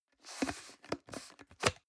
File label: plastic can small lid screw open medium